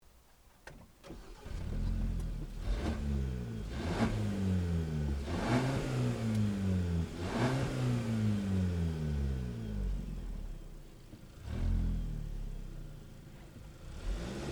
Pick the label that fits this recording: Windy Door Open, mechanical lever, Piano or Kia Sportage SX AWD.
Kia Sportage SX AWD